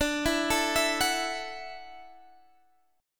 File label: F sharp Minor 7th Sharp 5th D